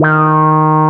QUAC, QUACK SOLO, Synth

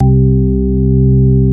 ORGAN; Best Service; Dance Mega Synth; 90 s Sample CDs

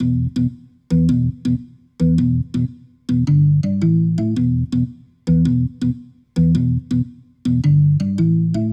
Rotor Riff, Organ

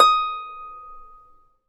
EB5 HARP; Complete Harps; USB Soundscan vol.62; AKAI

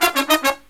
Killer Brass Riffs, 108 soul

